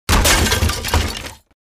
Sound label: Breaking furniture, wood, blows, chips fly